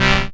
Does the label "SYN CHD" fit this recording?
Yes